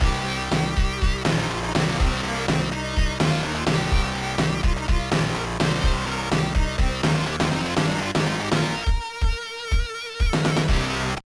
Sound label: Jingle; madden nfl 2004; gba